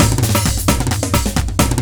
chorus fill toms; Loopasonic

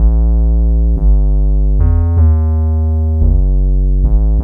spookie, bass

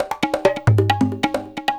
perc 134; Loopasonic

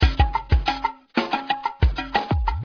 drums

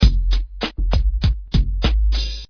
low hip, drums

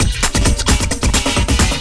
haze, drums; Loopasonic

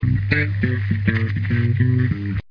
solo, bass

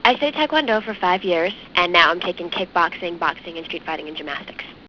martarts

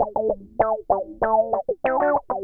wah, guitars; Loopasonic

